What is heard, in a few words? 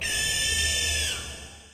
Owlscreech, owl screech; Friday the 13th: Killer Puzzle